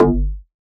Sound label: Donk Bass G